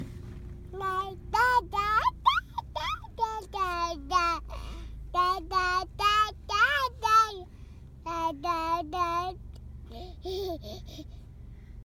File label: Crying